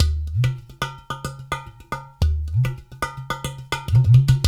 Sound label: UDU, Udu Bata; Spectrasonics; Supreme Beats